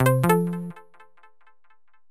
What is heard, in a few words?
Logoff